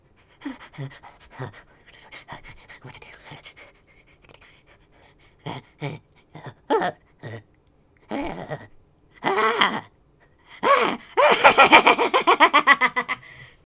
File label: Male Idle